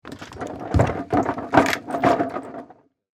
Wood Bridge Walk, Footsteps, Wood, Bridge, Walk, Short, Shake, Rattle; BlastWave